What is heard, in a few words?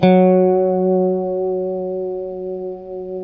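F#JC120; Guitars; Spectrasonics; Hans Zimmer Guitars VOL-1; JC-STRAT MF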